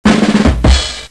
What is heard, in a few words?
drum roll rimshot